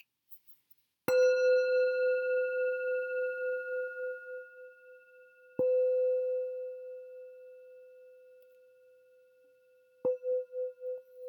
jambati 105cm si, cuencos tibetanos

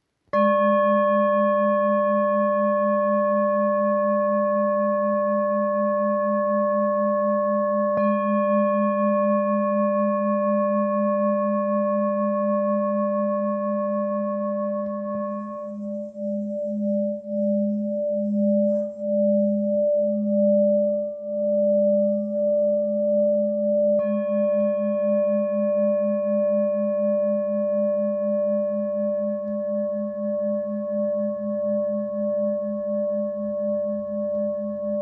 Cuenco; deco

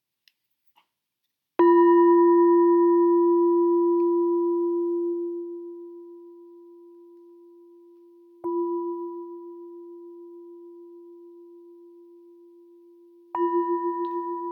sin pulir, cuencos tibetanos

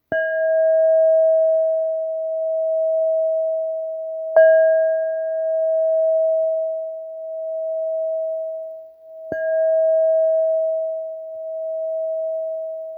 Amarillo mi, cuencos tibetanos

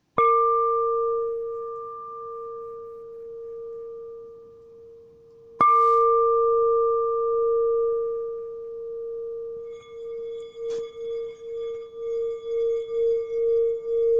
1125g la, cuencos tibetanos; amarillo

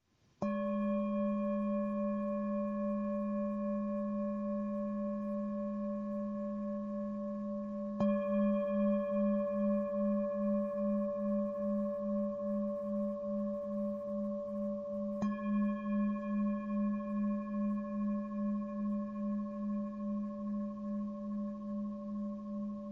urano, cuencos tibetanos

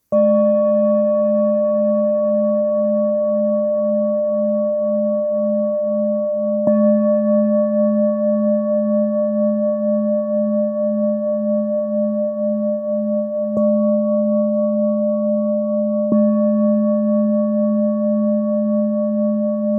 sol neptuno, cuencos tibetanos

